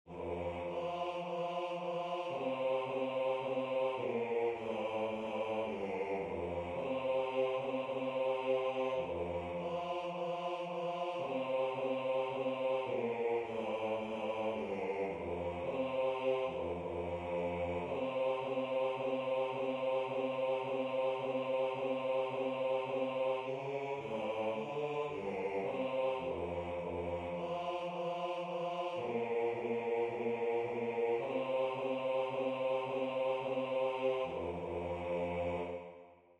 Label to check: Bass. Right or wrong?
Right